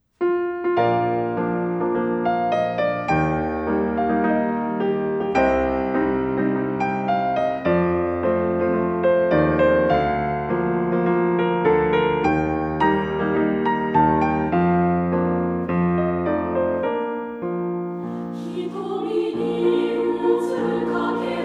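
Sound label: Female Chorus; 女声三部合唱（１番のみ）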